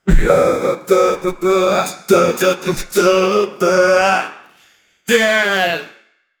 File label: hardcore dubstep music